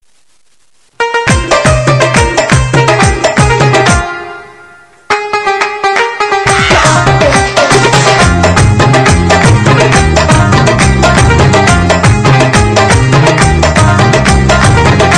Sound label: Pashto